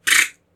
gun; Fight Knight

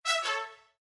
Festival Hardware Remove, windows 7

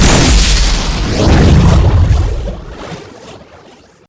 Weapons Pulse

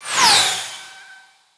Ball Sounds Pass; pangya fantasy golf pc

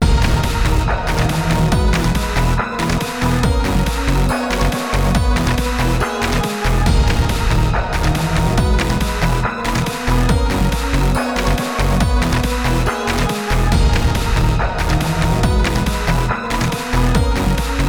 STAGE bgm; Street Fighter IV

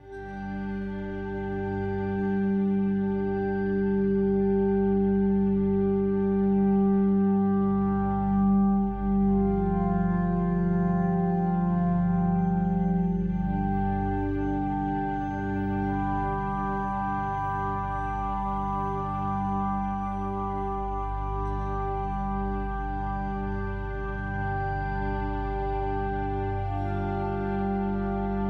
Loop Stealth

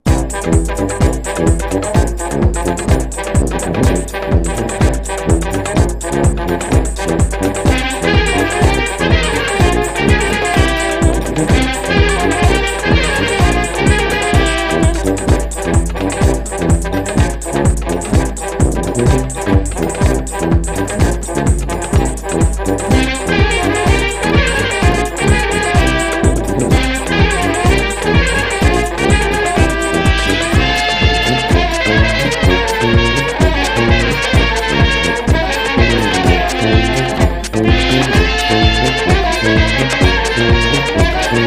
a drum's rythm; Napple Tale: Arsia in Daydream

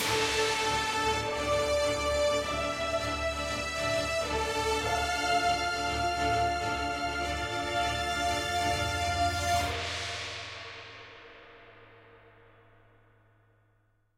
Escape Stinger; Forbidden City

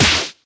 Punch short

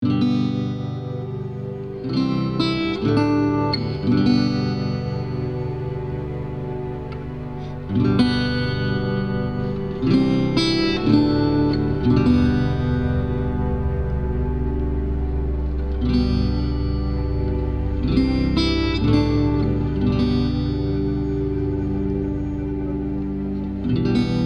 Neutral Loop

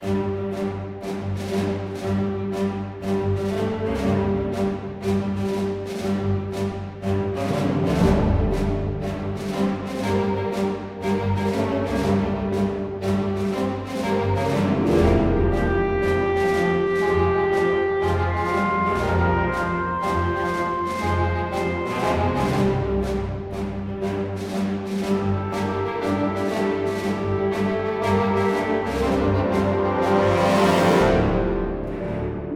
Starfighter Music; Galatic Starfighter

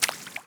walk water

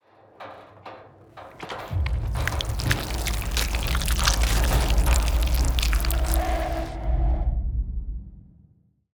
Rat Can Eat; Ending2

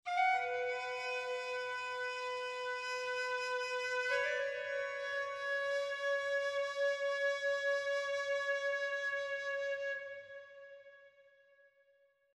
calliope flute; God of War Chains of Olympus; psp

